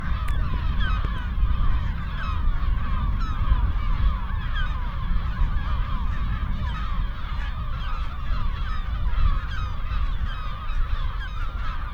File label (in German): moewen; trischen